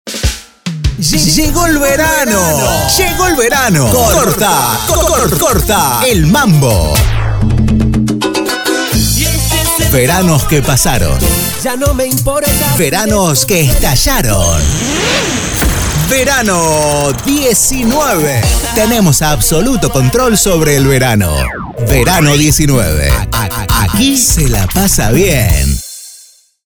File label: Tropical